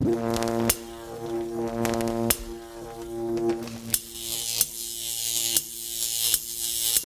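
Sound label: Electric Arc Variable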